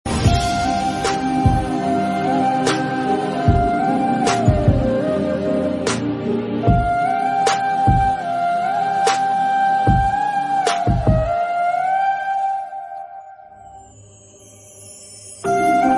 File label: Love Bgm Ringtone; Thani Oruvan